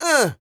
YELL, MALE HUMAN; Spectrasonics Vocal Planet

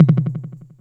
Tom Delay, TR-606 Bent Samples; Sonic Foundry (Sony Creative Software); The Electronic Music Manuscript Collection; Battery 3 Sample Kits

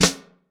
NAT FLAM; Best Service Hyper Dance

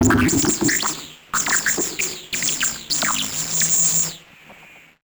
Malfo Syn, malfunctioning synth

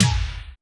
Mega Drums, Korg 01W

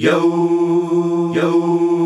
YOOOOH F, male choir; Best Service; Hyper Dance

